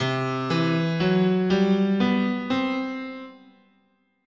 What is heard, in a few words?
C Chinese, scale